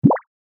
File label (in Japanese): ぴょこ; 動畫和制作視頻的音效